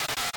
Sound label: Snare